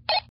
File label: radio OFF